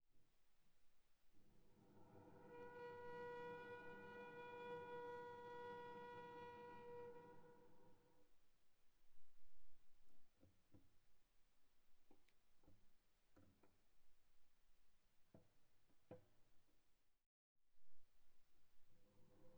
Viola arco sul D pp C6 D6 stereo

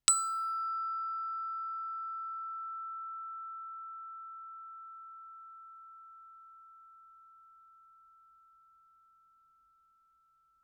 bells plastic ff E6 stereo; Percussion

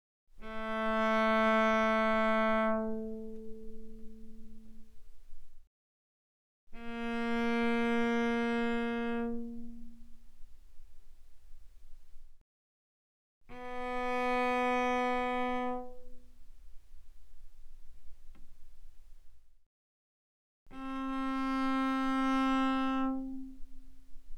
Cello arco mf sul A A3 Ab4; mono; Strings

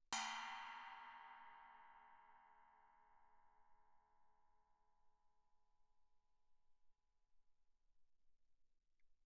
chinese stick bell mf, suspended cymbals; Percussion

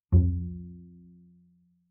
Bass pizz ff sul E F2, Double Bass; MIS Pitches - 2014; Strings

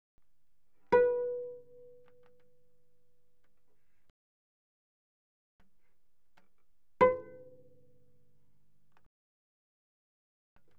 Cello pizz mf sul A B4 Ab5; Strings

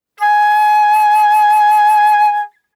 Flute vib ff Ab5 stereo; Woodwinds

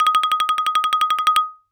Xylophone hard rubber roll ff Eb6 stereo; MIS Pitches - 2014; Percussion

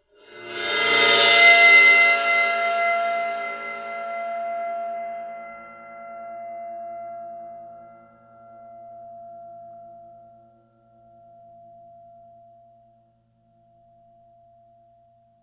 crash bow, Suspended Cymbals bowed; Percussion

